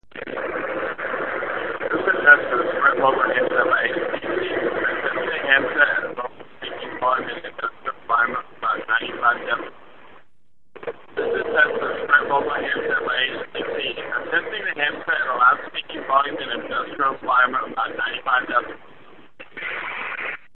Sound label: Indust 95db; Mogul